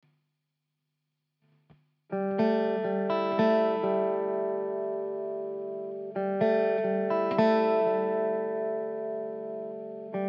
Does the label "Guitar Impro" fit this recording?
Yes